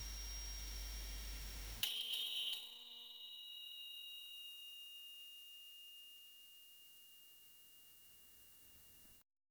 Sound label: a diamond is being forged, spark